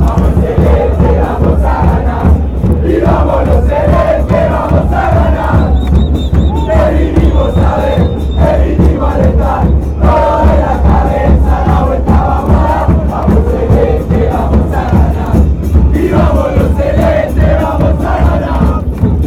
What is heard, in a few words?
chant, fanchants; we came cheer you